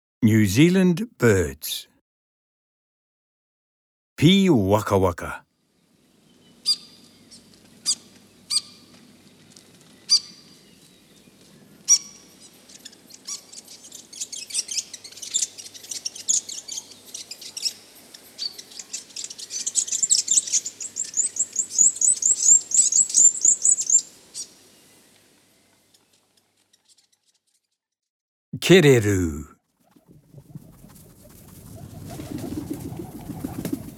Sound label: New Zealand Birds audio (birdcalls)